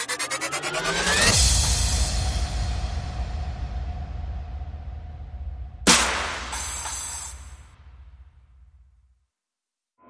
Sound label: Perc and FX